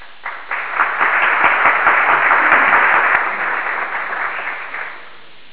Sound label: applause